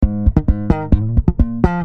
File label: Bass Slap Ab, Bb 131